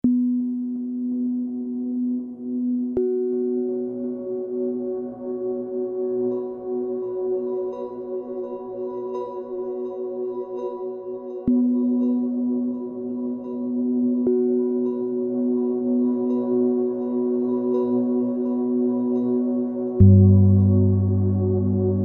Echosines Pad

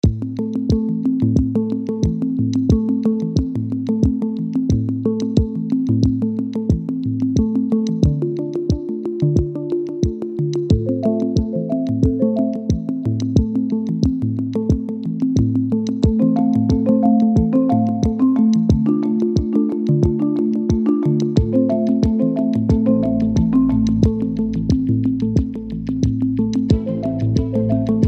Sine Pattern Bed

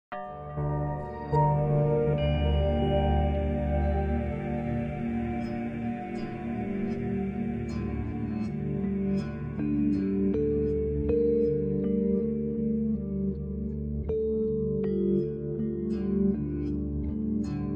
Dark Sacrale Ambience, choir and strings

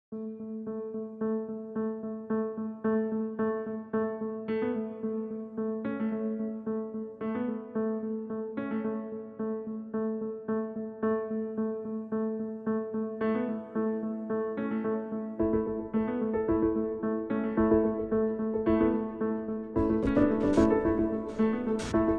Many Pianos

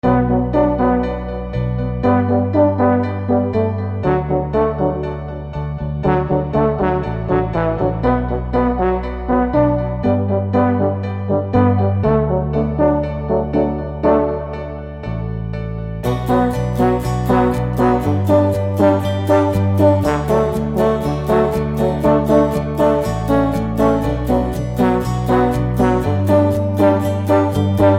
Tuba Pump Fun

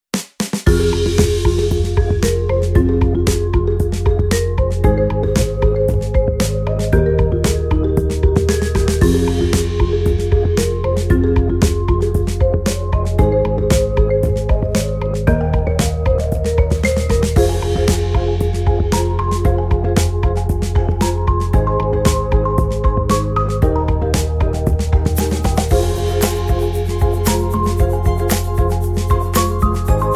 Marimba Version

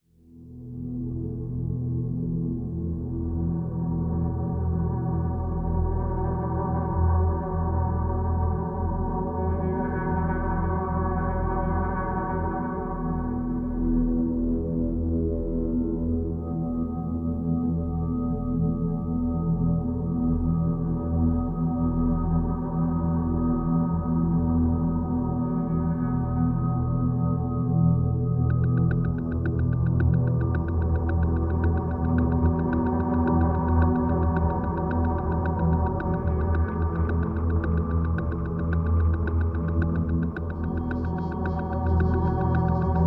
Atmosphere

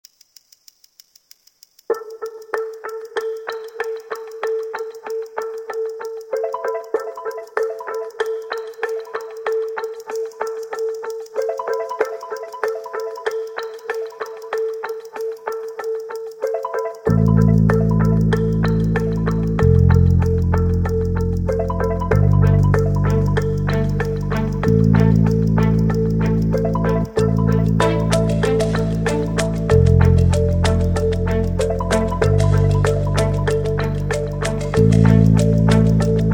Curious Steps

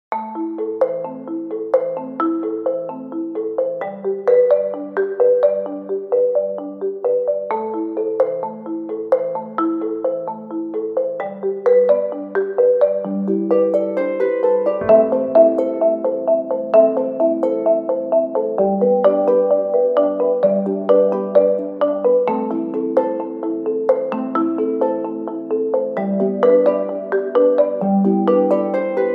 Wooden Bug

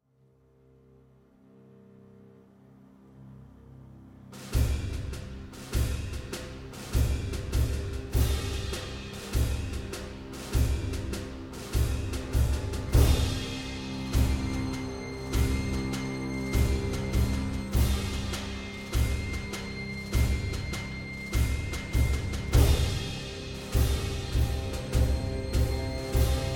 Dunkle Marschmusik without glockenspiel

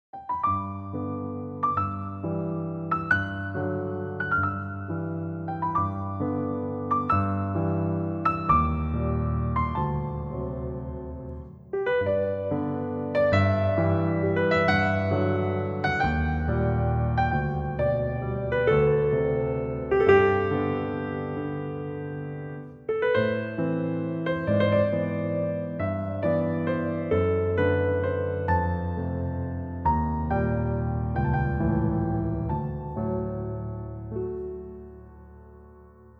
Nostalgic Piano Waltz

